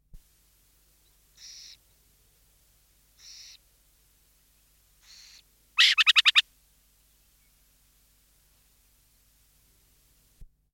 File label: burrowing; bbc; nhu; silent flyers bird display